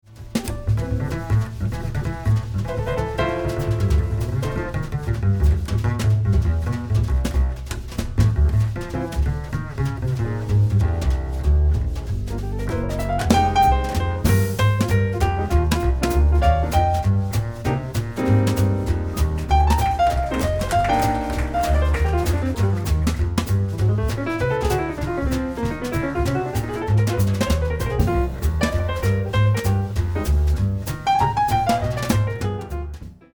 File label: Melody Bass Samp